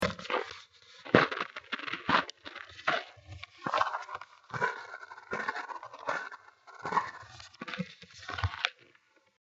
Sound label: walking through snow